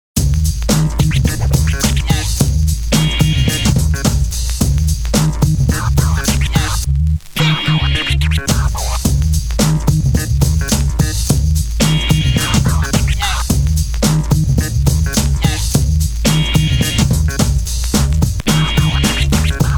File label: Hiphop ringtone, Nokia E52